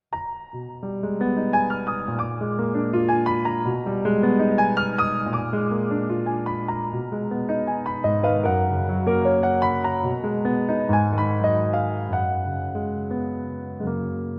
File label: piano cover